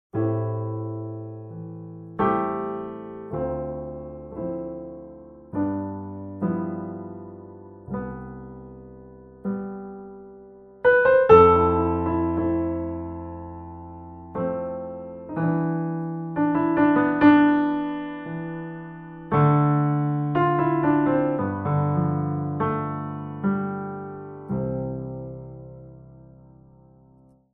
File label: Piano; Piano Comparison